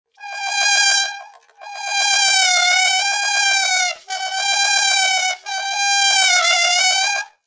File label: Sax Mosquito, A saxophone sounds like a mosquito; Saxophone Mosquito Sounds 2; Horns; Musical